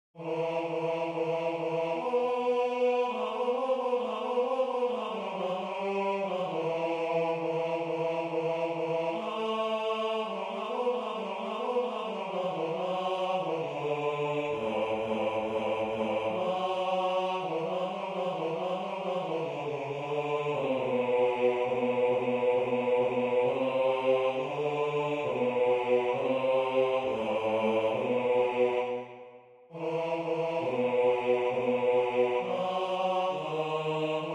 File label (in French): Basse; Antonio Vivaldi; Gloria